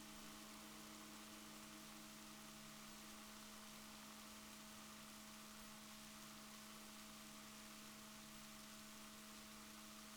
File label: rorquals negatif, Balaenoptera Physalus Chile